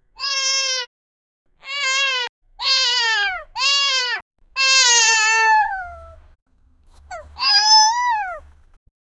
puppy crying sound

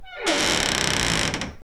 loud glass door creak

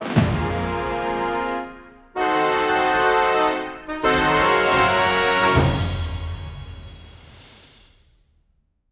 explosive mega win lou, triumphant

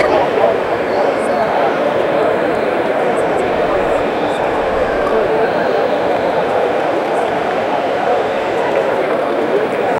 soft subdued test match, cricket

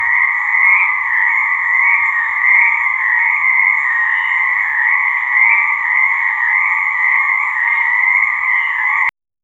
multiple frogs call; discordant

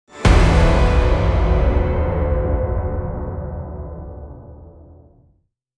cinematic 7 second openin, abrupt ending

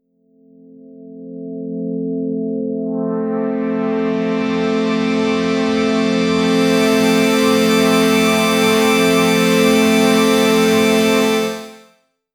windows pulse startup sound, computer sounds

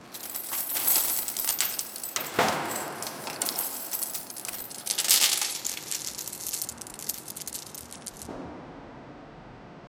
envelope stuffed with cas; rain

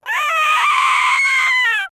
death

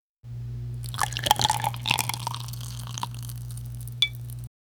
pouring green cendol into, beverage

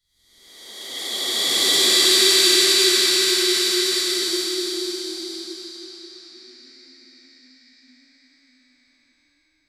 stretching flicking air, ghost

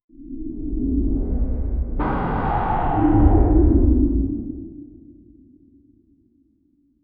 gentle airy jump sound, platformer

